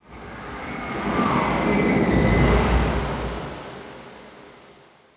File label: flume dist; Tony Hawk's Project 8; XBOX